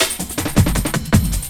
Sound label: LOOP SD, Drum & Bass, 160 BPM; Ueberschall; Drum & Bass Flash Trackz